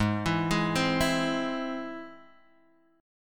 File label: E Augmented G sharp, guitar